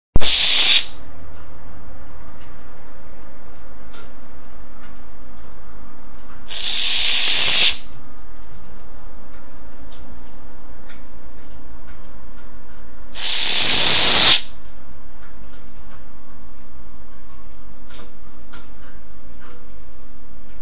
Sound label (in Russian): kriki sovi domovogo sicha